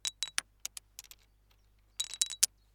Small Pebbles Rolling Cailloux Petits Sons

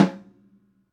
Snare Tight Open High Vol

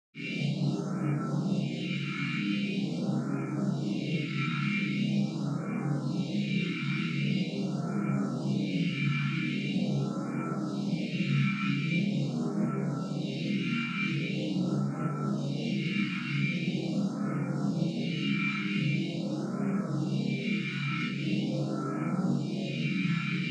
Portal

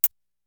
Tick

